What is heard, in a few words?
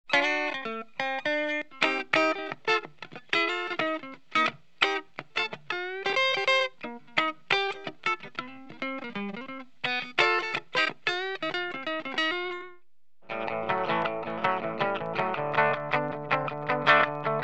clean Tweed DeLuxe